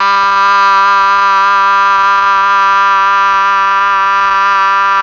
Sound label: MEL OBOE